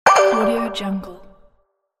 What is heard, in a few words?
button menu application